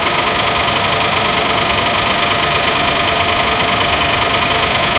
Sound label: tractor engine looping; agriculture